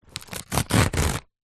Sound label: foley notepad small binded pages several rip tear out; zapsplat